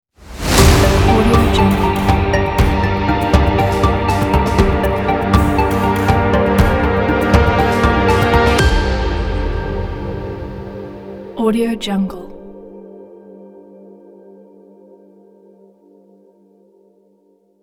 broadcast news logo intro

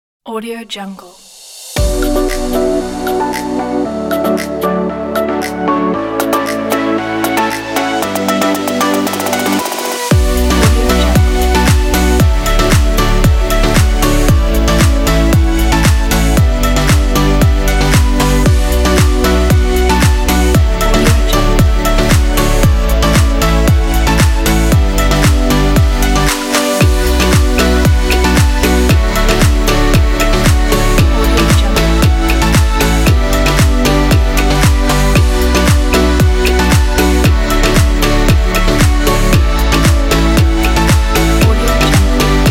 tropical house

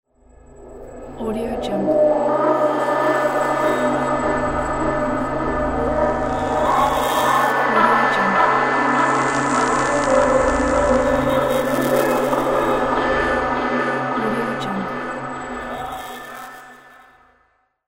paranormal

